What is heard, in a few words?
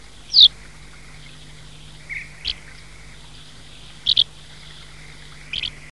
Ptyonoprogne rupestris, chant; Hirondelle de rochers